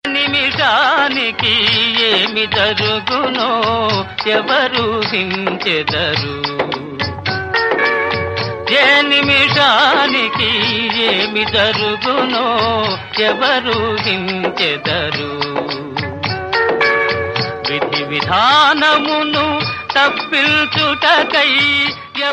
telugu ringtone

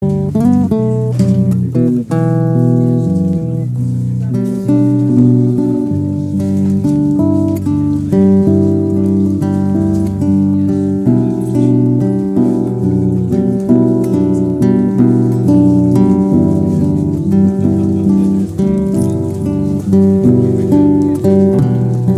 brazilian guitar instrumental; ringtone

